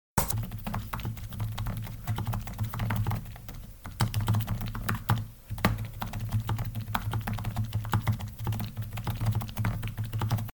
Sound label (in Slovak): klavesnica